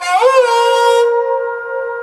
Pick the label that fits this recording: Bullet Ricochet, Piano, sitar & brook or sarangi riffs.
sarangi riffs